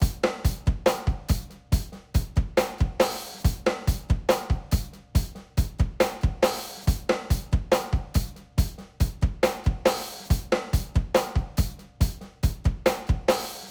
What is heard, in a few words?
rock